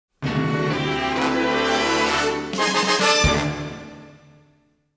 without narr; Neon Genesis Evangelion; Evangelion S2 Works

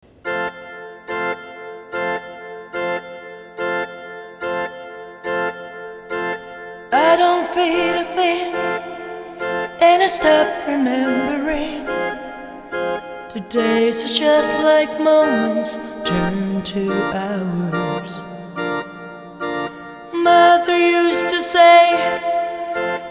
Vocal; (Female Vocal Version); Cowboy Bebop; CD-BOX Limited Edition

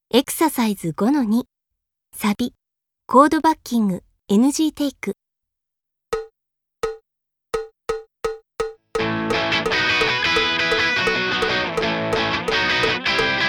Backing NG Take

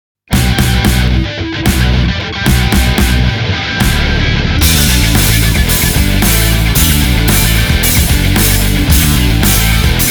Heavy Metal (Instrumental); He is my Master

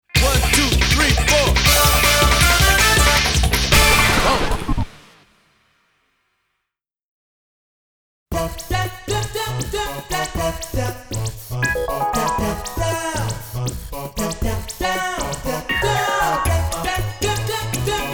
Funky! Electric Pop; Mahou Sensei Negima; Ongaku no Jugyou

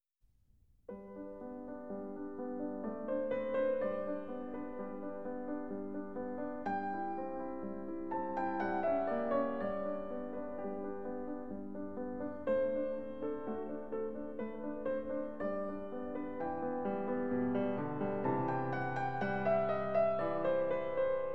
Piano Sonata No. 15 second movement; Mozart; Legend of Galactic Heroes; Galactic Empire SIDE

